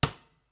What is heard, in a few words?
Impact Stone